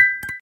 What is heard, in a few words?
Huawei Mate Pad Ringtone